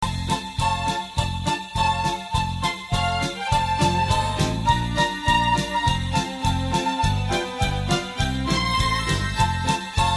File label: jingle bell violin version ringtone